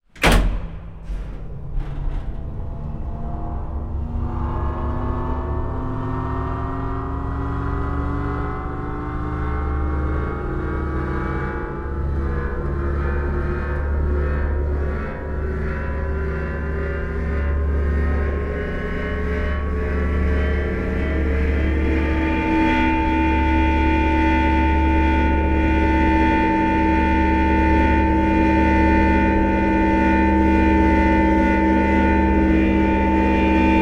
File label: Squeaky Humming Machine Start And Stop